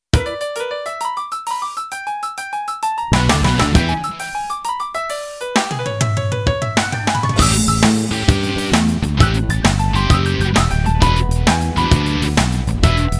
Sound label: Blues